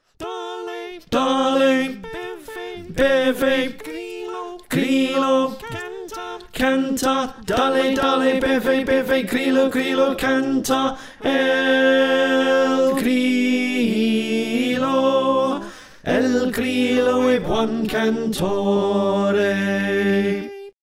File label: Slow Tenor